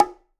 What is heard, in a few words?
Bongo High Open Hard; One Shots; Drums; Ableton Live 12 Lite; Core Library